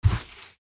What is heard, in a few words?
Footsteps on sand barefoot single step